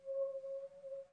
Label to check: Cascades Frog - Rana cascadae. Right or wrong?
Wrong